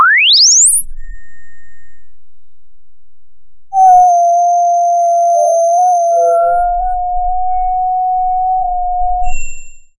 cria um som da bola

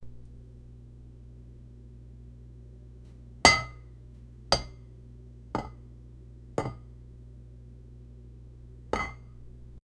um prato colocado dentro, sink

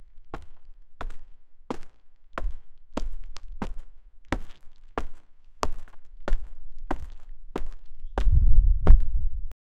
pulando de sapato no conc; urban

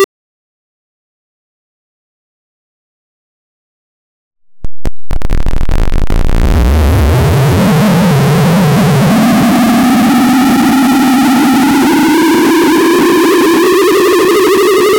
efeito sonoro de pulo, character actions

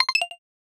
som de ui, success; som de ui de quando

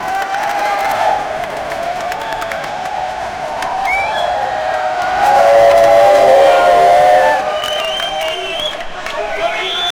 uma multido torcendo grit, motorsports

